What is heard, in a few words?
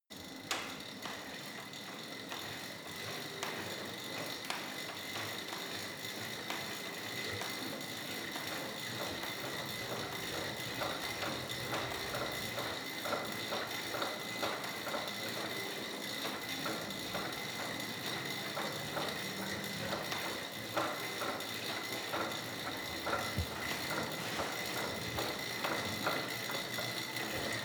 indoor bike sequence slow, fitnessstudio; sport freizeit